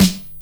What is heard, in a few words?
SD, dance drums hip; 90 s Sample CDs; Best Service Dance Mega Drums